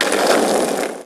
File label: skate; bucky